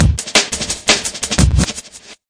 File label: 173 quality, drum loops and breaks; 161 to 180 bpm